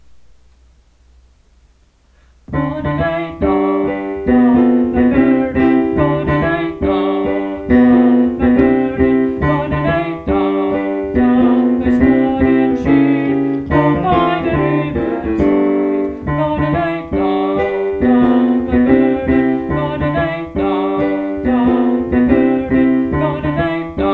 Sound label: down Bass